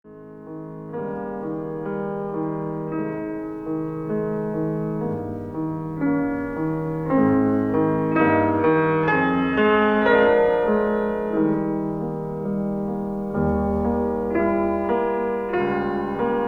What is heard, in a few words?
Piano